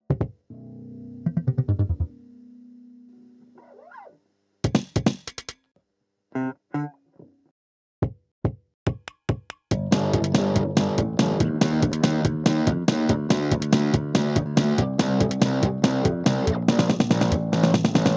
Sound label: Only Bass